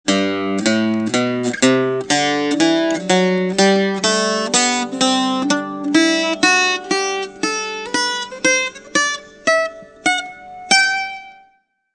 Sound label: Bouzouki